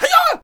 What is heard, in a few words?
Sensei Attack Vocals